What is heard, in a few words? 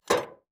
demolition